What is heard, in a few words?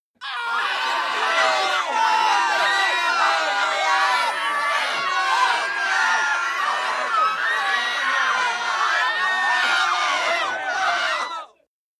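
Crowd Panic Aaaghs Screams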